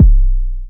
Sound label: kick